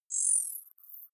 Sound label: zoom out light, signale